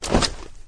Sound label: jump up, flood